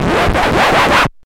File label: record scratch